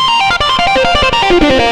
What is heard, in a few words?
xtra lead